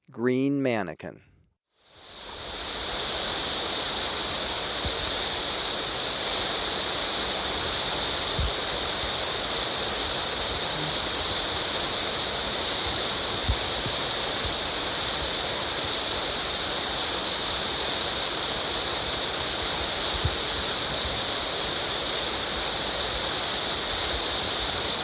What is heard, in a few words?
Green Manakin, Birds of Ecuador; S. America